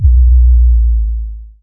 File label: bass; csgo